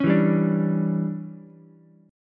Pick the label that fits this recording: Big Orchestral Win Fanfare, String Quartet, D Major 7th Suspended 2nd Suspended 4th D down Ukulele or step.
D Major 7th Suspended 2nd Suspended 4th D down Ukulele